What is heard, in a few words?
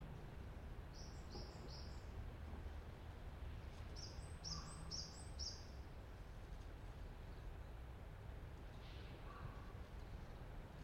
yamanakako sound